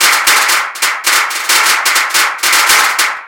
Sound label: cracker